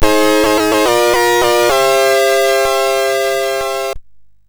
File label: sid MOS6581R2; Fiendish Freddys Big Top O Fun